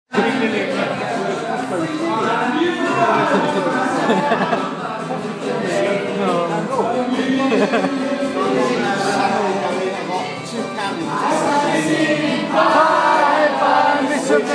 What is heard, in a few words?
drunk Xmas party